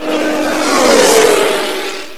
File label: passby, This sound is car speeding by.